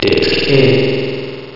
disk in

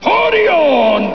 Filled Layer; FRAC 1.0

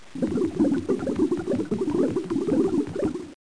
Bubbles; Bible Fun Pack; Education Publishing Concepts

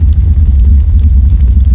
Fire-Loop; SpecOps2 MPlayer Demo

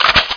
reload rifle; SpecOps2MPlayerDemo

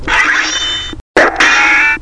Schwing; The Heroic Legends of Hercules and Xena; OPENING

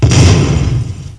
explosion; Ferazel's Wand Demo

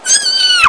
YEOWWWW

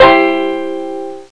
banjo, string instruments; Sound Effects for Multimedia - Volume 1

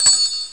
twizzle; Blender Magazine volume1.3 1994; moogly

